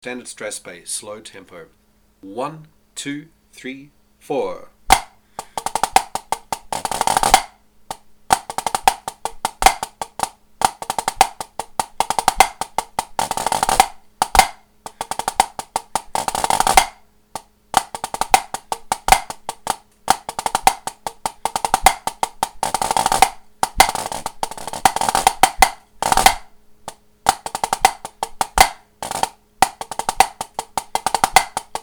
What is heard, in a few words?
strathspey standard experienced slow